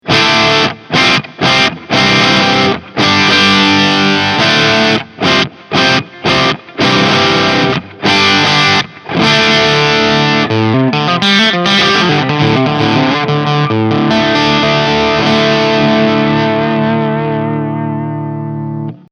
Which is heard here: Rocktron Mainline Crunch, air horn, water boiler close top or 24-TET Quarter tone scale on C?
Rocktron Mainline Crunch